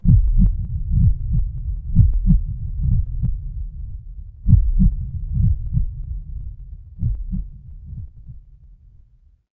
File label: horror heartbeat